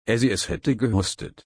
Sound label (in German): husten